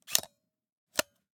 Camera, Pentax KS2, DSLR, mechanical, flash up, close, XY; Eiravaein Works; Vaeyan IV